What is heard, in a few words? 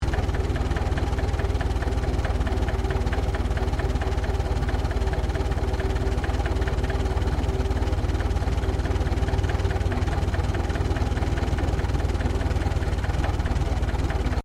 Truck Chevrolet 1926 30cwt Idle Interior Loop